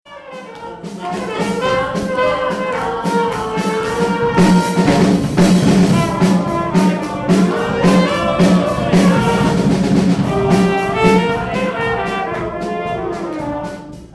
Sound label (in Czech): Glori aleluja dychovka